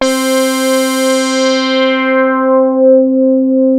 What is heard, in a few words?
LEADSWEEP, BASS STATION; Syntec; Wall of Sounds